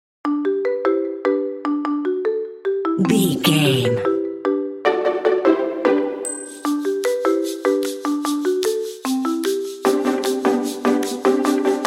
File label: happy go lucky narrative